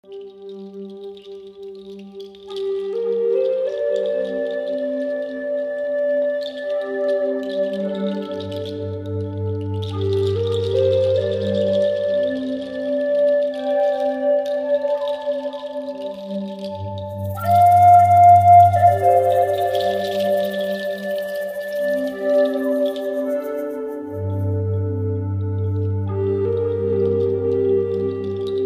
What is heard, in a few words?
sample, indian flute